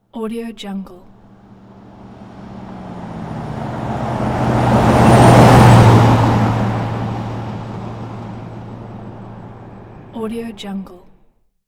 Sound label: truck utility jeep 30 mph by; hollywoodedge